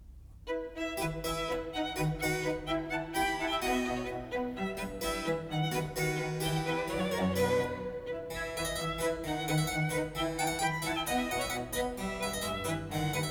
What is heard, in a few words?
Trio Sonata For 2 Violins And Basso Continuo No.2 in E minor; Vivaldi